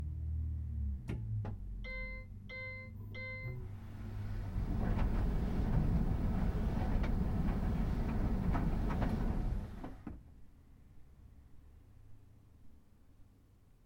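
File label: drying finished reminder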